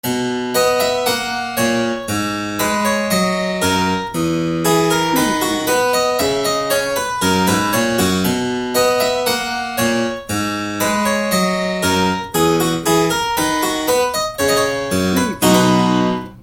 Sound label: regal dance loop